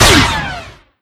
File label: pistol; damons